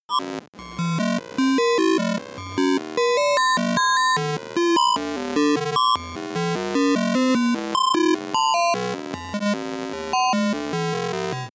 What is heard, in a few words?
broken computer low long